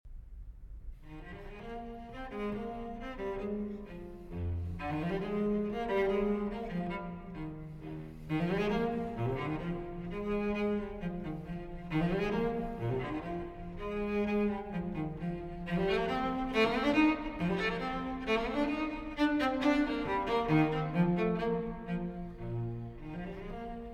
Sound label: Cello Suite No. 4 in E-Flat Major, Bourrées I & II; BWV 1010